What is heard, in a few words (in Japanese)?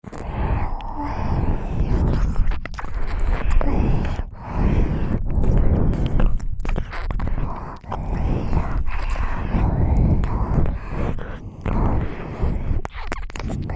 吐息交じりの両耳舐め 耳塞ぎ舐め; 琴音; オナサポ用おまけループ